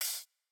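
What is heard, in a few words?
Jrock 3 open, hihat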